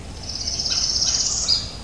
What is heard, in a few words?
parula, birds